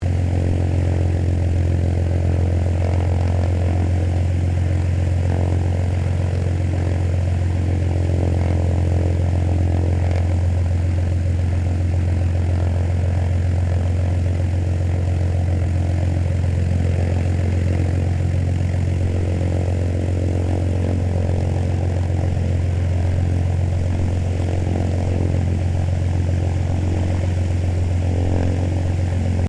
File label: AIRCRAFT PIPER CHEROKEE 6-RG IDLING, Light Civil aircraft; Airplanes; Vehicles